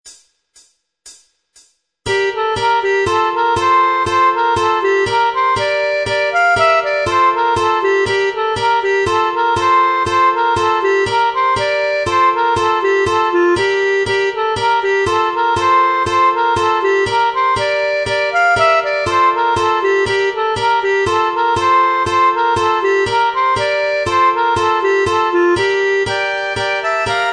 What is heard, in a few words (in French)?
Branle d Ecosse; Arbeau